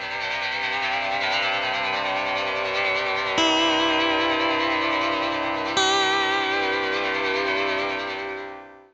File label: LAP NOISE, Guitar & Bass; Quantum Leap